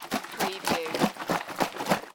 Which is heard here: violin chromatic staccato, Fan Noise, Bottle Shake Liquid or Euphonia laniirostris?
Bottle Shake Liquid